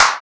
Clap, Percussion; Sample Banks

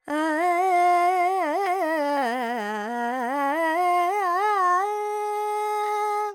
Dorian, Vocals Carnatic Female Alaps 70 G Dry; Sample Banks

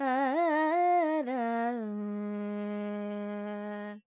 Pentatonic, Vocals, Carnatic Female, Alaps, Dry; 70 G; Sample Banks

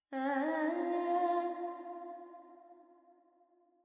Pentatonic, Vocals Carnatic Female Alaps 70 G

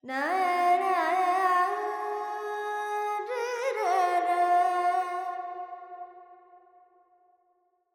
Verb Dorian, Vocals, Carnatic Female, Alaps, 70 G

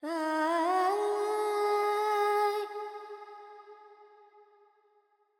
FX Phrygian, Carnatic Female Alaps; Sample Banks

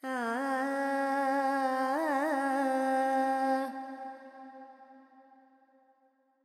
FX, Vocals, Carnatic Female, Alaps, Charukesi

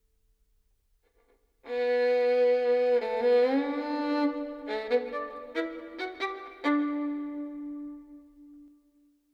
violin verb 4-4 Bm 70; Melody; Sample Banks

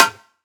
Perc; Sample Banks; Percussion

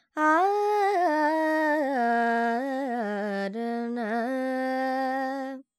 Lydian, Vocals, Carnatic Female, Alaps, Dry; Sample Banks

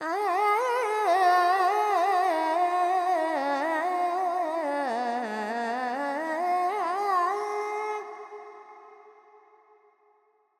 FX Dorian, Vocals, Carnatic Female, Alaps, 70 G

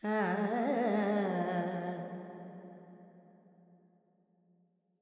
Carnatic Female Alaps, Lydian, 70 G, Verb; Sample Banks

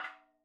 madalam notes L; Sample Banks; Percussion; MADHALAM

